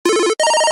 Ringer, BlackBerry; OS-4.6

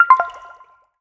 Frog Fun; Honor; X7C

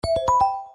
Keytone send; T390K Wine 3G; LG

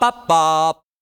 BaBa, notifications; Lenovo; ZUI 13

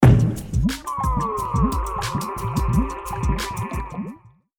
Power Off; Play; Poland

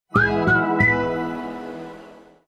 Whistling; China Mobile; A3s

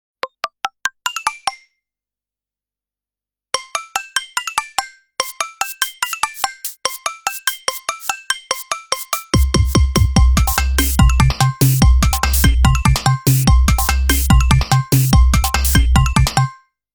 Chipper, Ringtones; Nokia 208; Southeast Asia

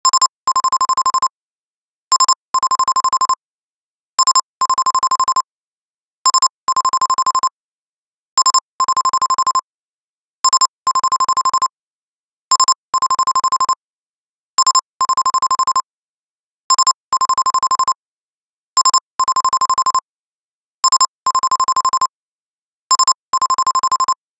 Bell, ringtones; LG VELVET 2 Pro

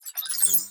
Wild Un Lock; ZTE Nubia RedMagic; ui

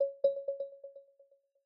ui loading; Google; Material Design sounds; Secondary System Sounds

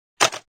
click, shutter; Kyocera; KX5 Slider Sonic Virgin Mobile

